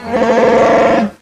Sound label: chewie; css